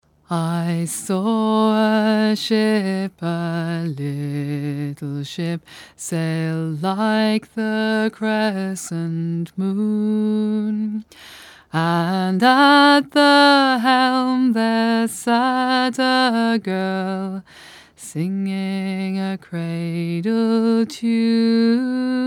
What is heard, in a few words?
alto